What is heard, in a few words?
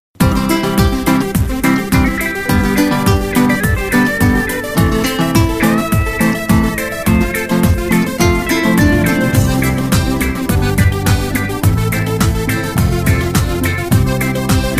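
electric harp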